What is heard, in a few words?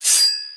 sword; knife up